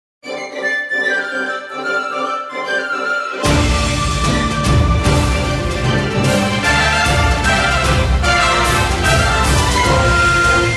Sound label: Battle BGM